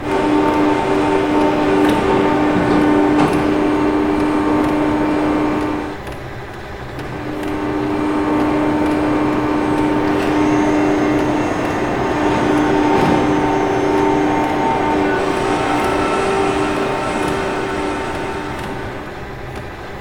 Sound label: Ambience Exterior Factory